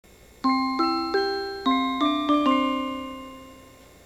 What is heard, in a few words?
chime; odakyudentetsu